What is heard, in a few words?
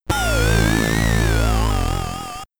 Stage Clear, SID Stereo; eon commodore 64